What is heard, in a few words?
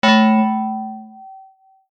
warning; reactos